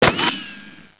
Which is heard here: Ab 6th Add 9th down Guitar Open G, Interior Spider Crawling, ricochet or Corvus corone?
ricochet